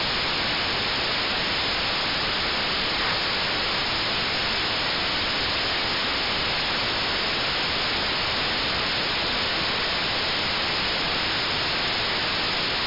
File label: MSK144 140ms; 432mhz